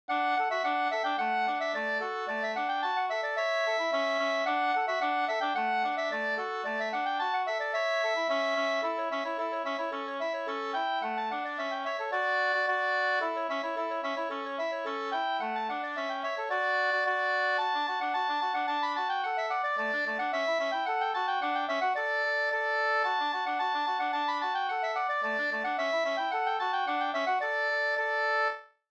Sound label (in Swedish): brannvinspolska; Rundar; A Jeppson